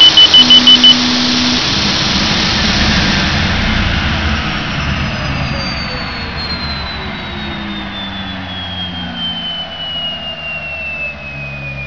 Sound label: turbine shutdown; fgdata